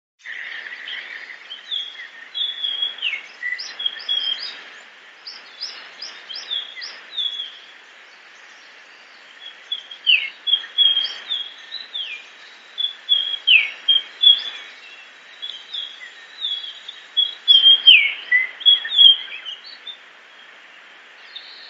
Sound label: Eophona personata